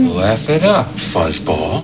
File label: fuzz